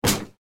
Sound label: Kick; Lucka